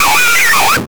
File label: an old money addition sound, financial